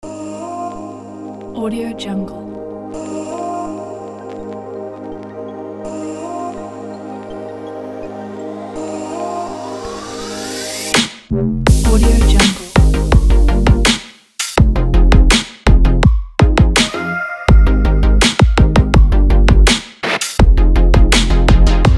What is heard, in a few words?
in the future bass